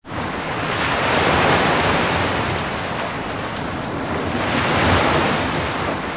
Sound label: pirate Amb